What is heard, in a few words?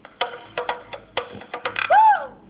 Conga